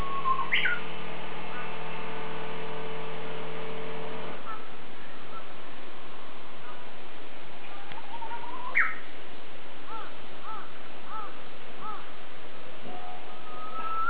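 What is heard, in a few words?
uguisu, Japanese bush warbler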